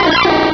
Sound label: cry; source of the sovereign